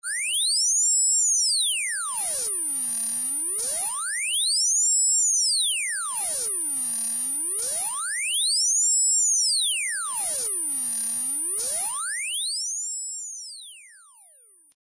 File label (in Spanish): Altas frecuencias, ringtones